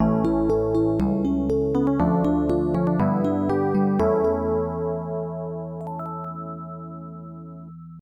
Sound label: [Game Over]; Olmang Jolmang Paradise; ms-dos